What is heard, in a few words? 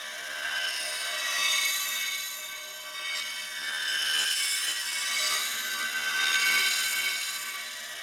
Sacrifice Symbol Found Ambient Loop; Warframe; Quests; Chamber